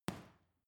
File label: boxing punching bag side hook soft; sport freizeit; boxen